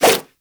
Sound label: Nunchuck Whoosh; Weapons; Warframe; Infested